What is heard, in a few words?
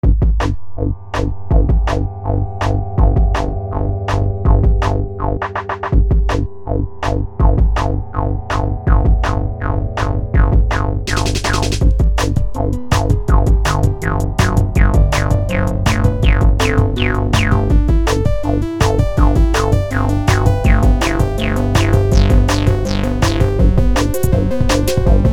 Funk